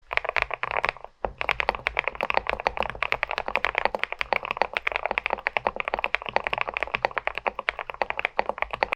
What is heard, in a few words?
thocky